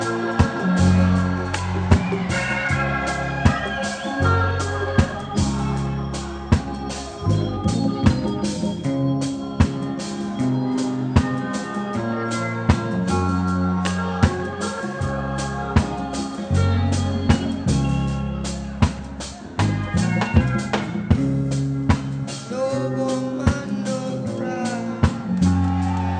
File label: reggae